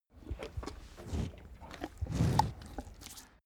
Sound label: cow eat breath